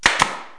air brad; KABOOM! - Wild and Wacky Sound Software

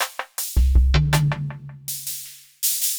PERCUSSN, Ambient, Drum

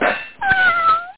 cat

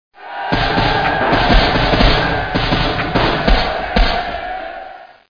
melee

